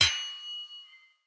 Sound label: Sword Clang; soundscrate